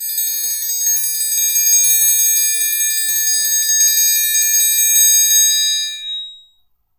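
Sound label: triangle tremolo, Synclavier Percussion; ILIO; Synclavier Percussion 2; World & Orchestral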